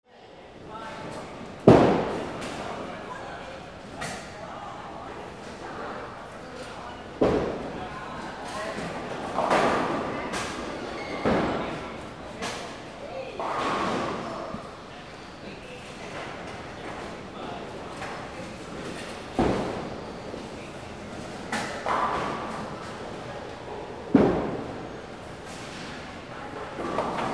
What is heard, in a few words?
GAMES